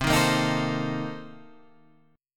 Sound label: C Minor 13th C Guitar Open G